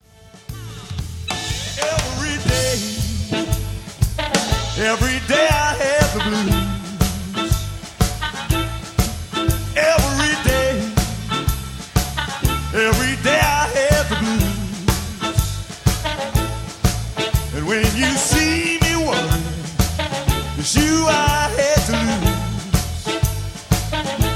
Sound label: third riff